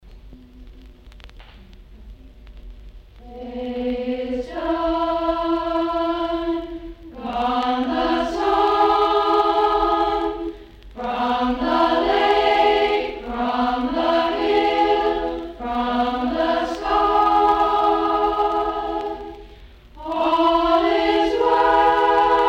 Taps; Chalk Hills Camp

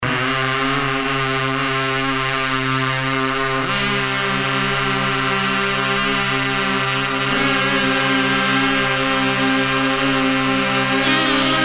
Kazoo Orchestra; Temple City Kazoo Orchestra

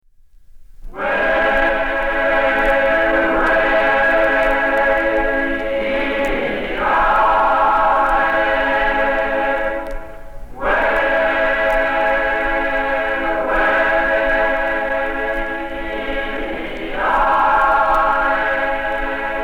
paddling song

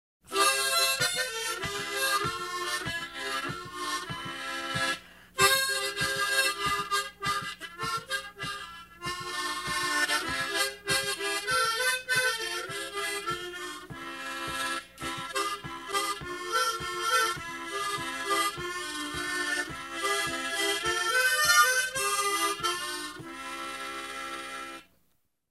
Brief harmonica interlude

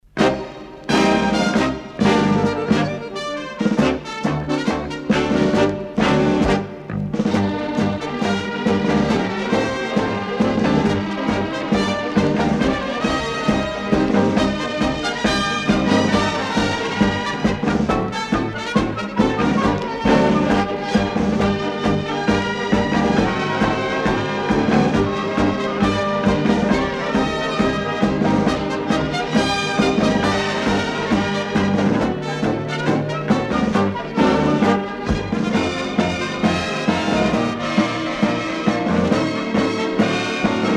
Hungarian Tango

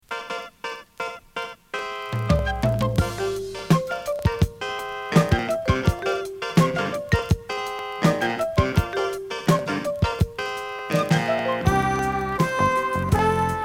Funky Brass

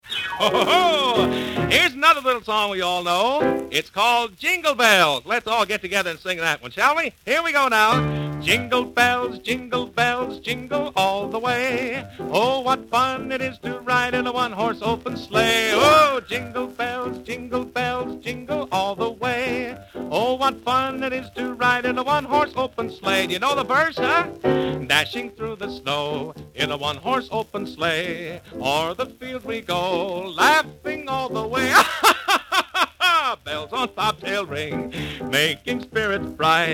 Jingle Bells; Special Ed Xmas

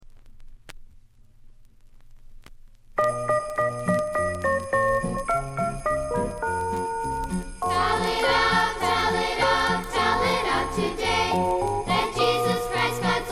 Choir

